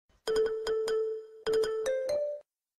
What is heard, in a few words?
Jingle